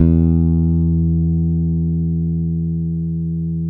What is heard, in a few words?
FBASS, Electric Basses